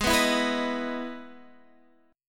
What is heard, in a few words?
E Major 7th Ab, chord